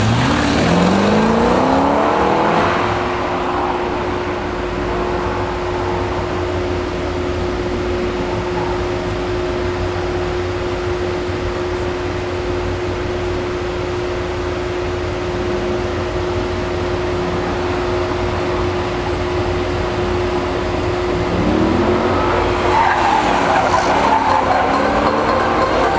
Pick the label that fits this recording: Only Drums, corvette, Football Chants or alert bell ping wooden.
corvette